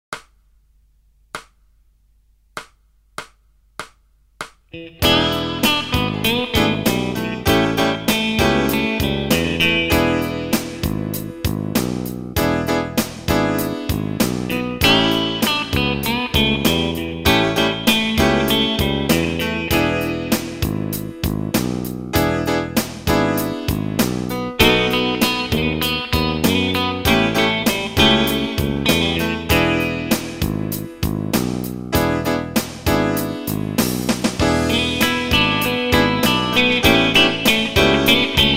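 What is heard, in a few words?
Blues in E, gitaar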